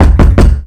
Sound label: Door Knock